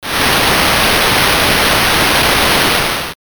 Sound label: rain